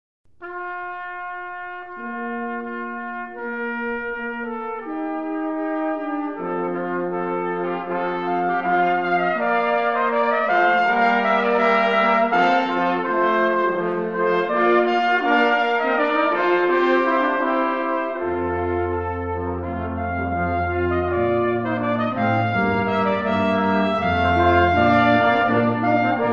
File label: Suite For Brass Quintet